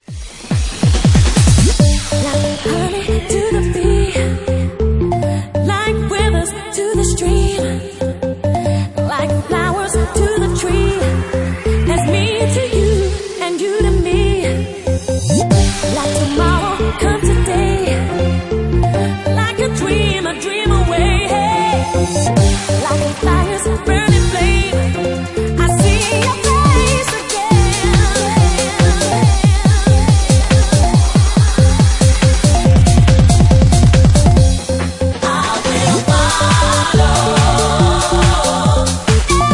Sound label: Organ Mix